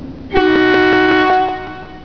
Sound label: horn